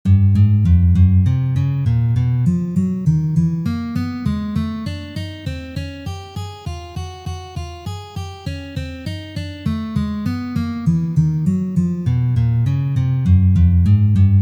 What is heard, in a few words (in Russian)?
Uprazhneniya dlya gitary 1 2 3 4